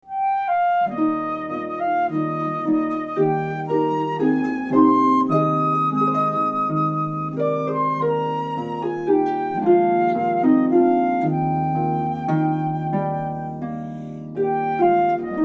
Believe Me if all those Endearing Young Charms, harp